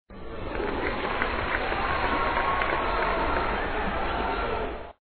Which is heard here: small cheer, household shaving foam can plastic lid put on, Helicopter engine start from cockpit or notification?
small cheer